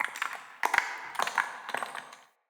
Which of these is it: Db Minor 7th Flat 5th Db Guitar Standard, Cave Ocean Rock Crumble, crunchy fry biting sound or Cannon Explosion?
Cave Ocean Rock Crumble